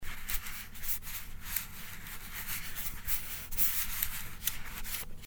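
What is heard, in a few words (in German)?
Handgeräusche, Roland R-05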